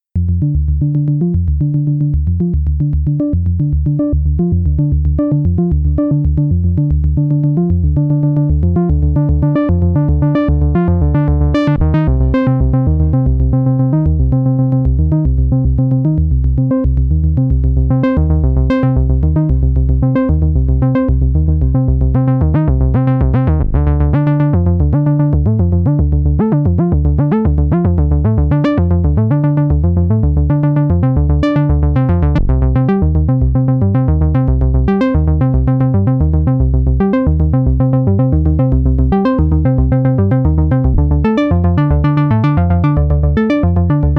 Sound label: Bass Station II; GRP R24